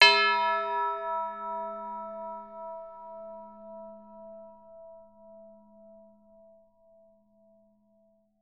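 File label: tubular bells E4; Best Service; Peter Siedlaczek's Advanced Orchestra; TBL LG LO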